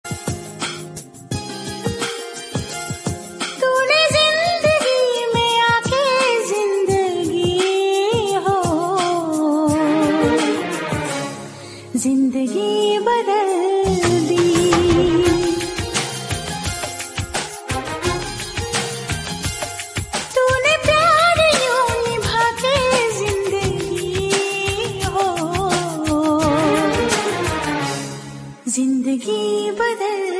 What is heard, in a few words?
old hindi